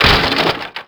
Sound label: hit flimsy wood; hits; Tony Hawk's Project 8; XBOX